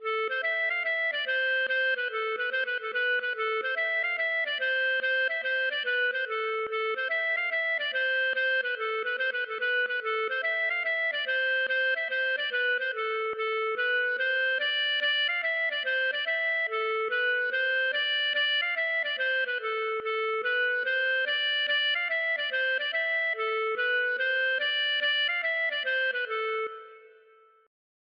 laride

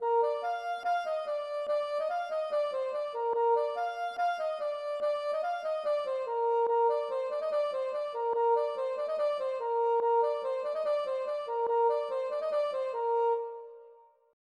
polka Piquee

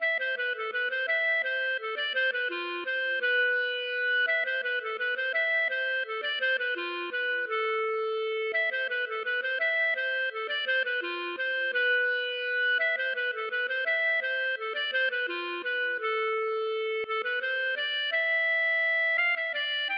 valse

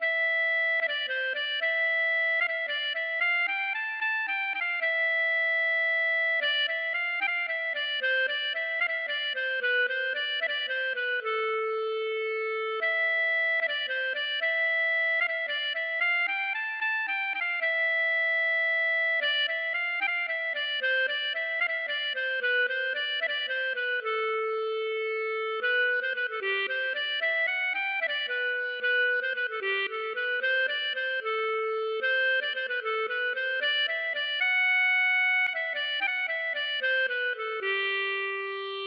valse

